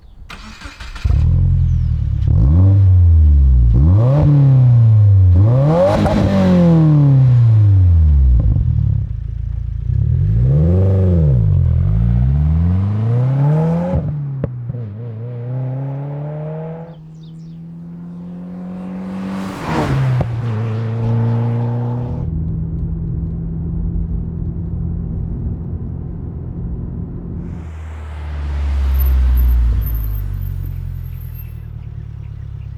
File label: soundcheck